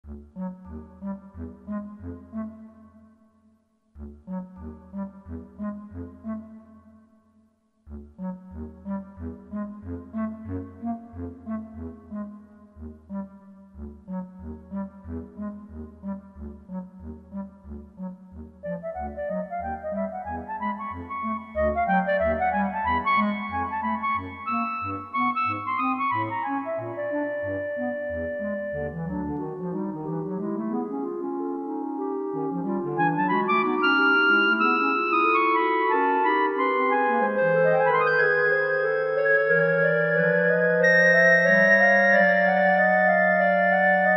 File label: Rowboat Shuffle Clars